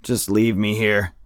tired; Escape from Tarkov; usec2

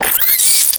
tunneler worm hurt

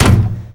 metal land

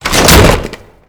target pop up wood; Modern Warfare

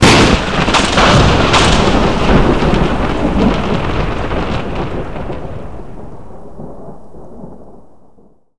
thunder strike; Call of Duty Modern Warfare

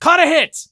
hurt light; Escape from Tarkov

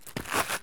turn concrete; Escape from Tarkov

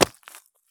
landing gravel; Escape from Tarkov

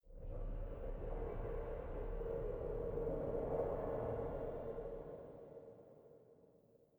sting metal groan; Rust

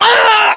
pain

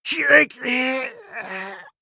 scav death, voice; Escape from Tarkov; scav6

